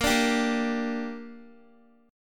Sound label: A sharp 6th, Guitar; A#6 strummed